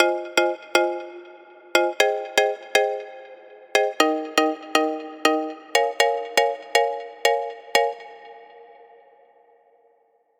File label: Chords Rhythm Pop; midi wizard 2; Unison